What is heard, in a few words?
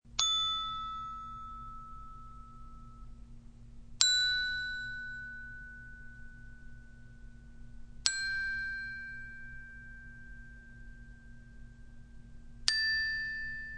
Eight notes played on a xylophone.; Mallets; Musical